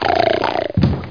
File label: Doom 2 Explosion